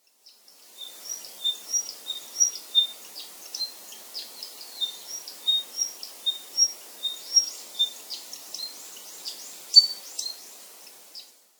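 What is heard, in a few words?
Forest Wagtail; iwamisekirei; sakata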